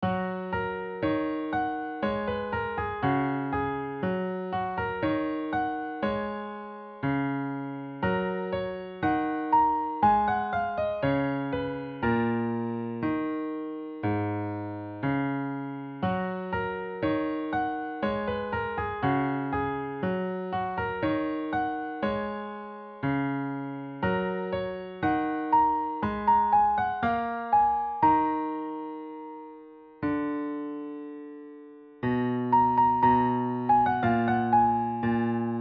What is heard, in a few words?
bass f sharp major